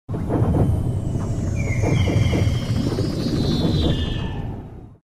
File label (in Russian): effekt vospominanii